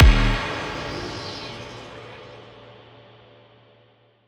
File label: Dubstep Impact; FX; Impacts; Cymatics; Dubstep Starter Pack